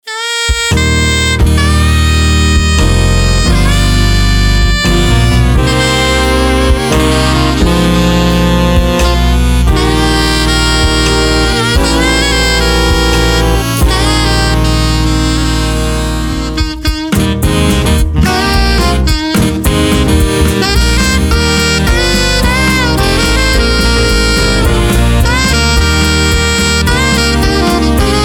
Sax slow and Fast, solo bb sax and piano

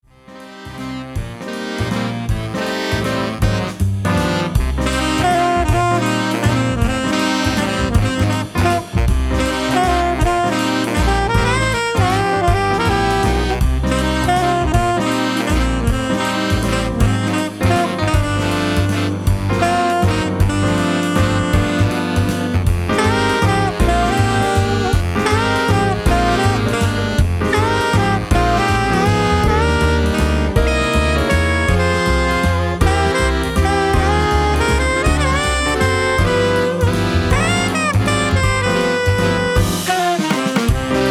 Sax Ens, saxophone ensemble and rhyt; for saxophone ensemble and rhythm section

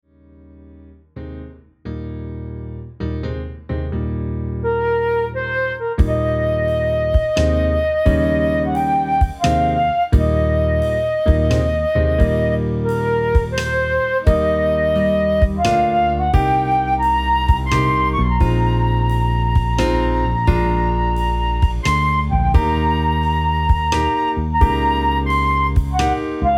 Midi Flute; solo flute and piano

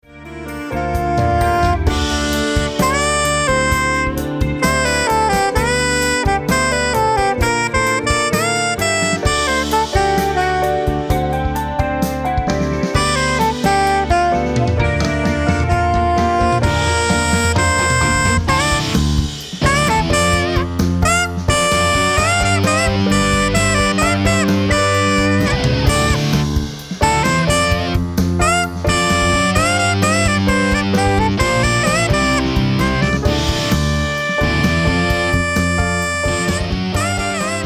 Ringin The Changes Sax, for bb sax and piano

